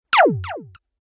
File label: laser; kenney digitalaudio